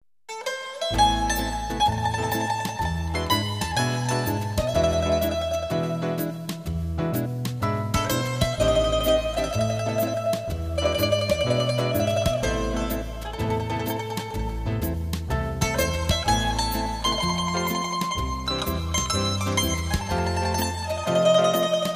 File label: jazzy